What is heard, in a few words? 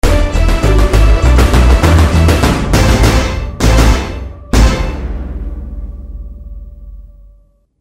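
jingle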